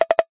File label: multimedia new message clicks wooden x3 fast